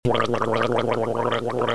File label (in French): Gargarisme; bruitage; Liquides